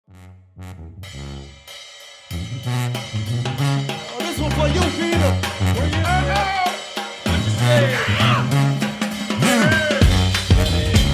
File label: Brass Band Big Tuba